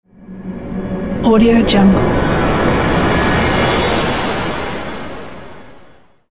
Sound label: massive swoosh logo